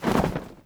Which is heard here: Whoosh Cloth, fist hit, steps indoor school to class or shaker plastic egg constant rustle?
Whoosh Cloth